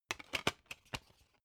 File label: dvd case remove dvd